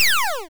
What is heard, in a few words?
power down